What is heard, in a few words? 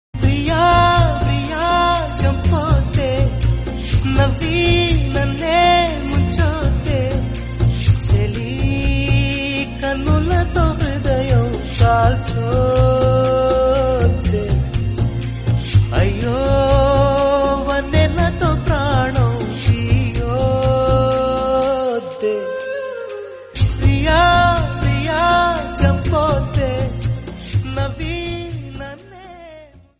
telugu ringtone; Jeans